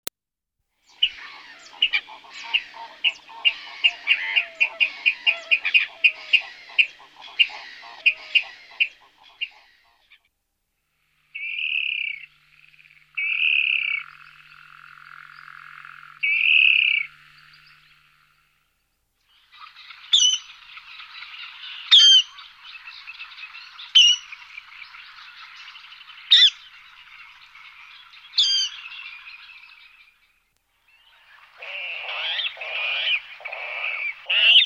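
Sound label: pastushok golos, water rail voice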